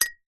household teaspoon single tap on side of cup ceramic mug